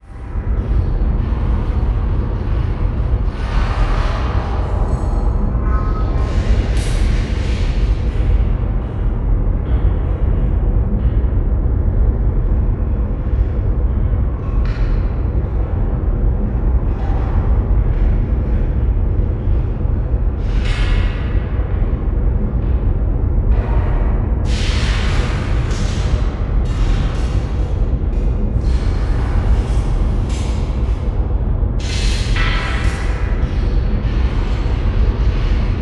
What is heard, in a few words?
ambience; bluezone; fvtt sonniss gdc audio